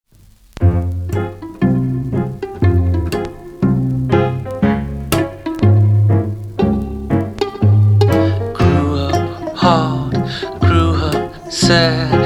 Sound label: Music With Vocals